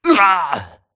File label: grunt death neck; Titanfall 2